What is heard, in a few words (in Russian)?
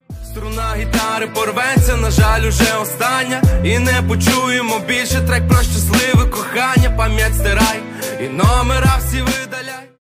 Pesnya, gitara